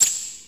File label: Splitter